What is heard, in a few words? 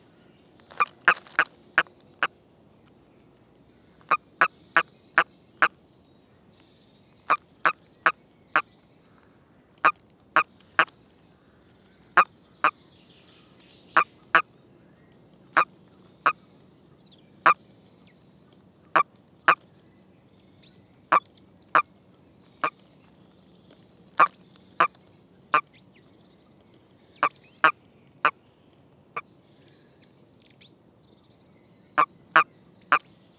common toad bufo bufo; lyagushek i zhab